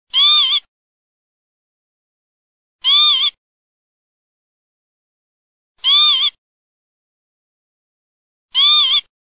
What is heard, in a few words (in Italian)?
pavoncella